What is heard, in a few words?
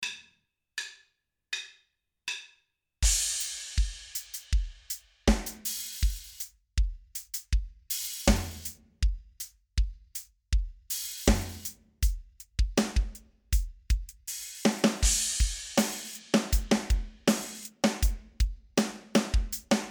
melo drum